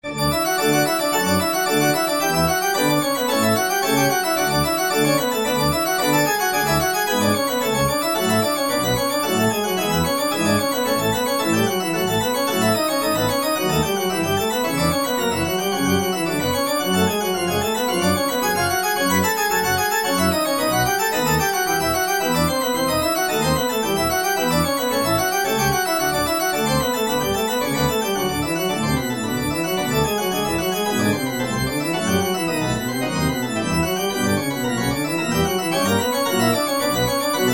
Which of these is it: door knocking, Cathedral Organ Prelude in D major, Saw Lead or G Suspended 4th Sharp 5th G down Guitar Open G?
Cathedral Organ Prelude in D major